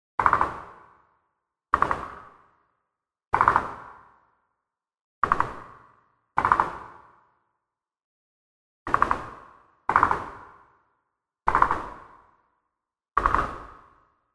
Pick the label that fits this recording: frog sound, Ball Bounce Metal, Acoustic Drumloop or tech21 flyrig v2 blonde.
frog sound